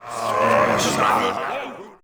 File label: murm